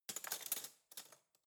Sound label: cutlery spoon drawer handle long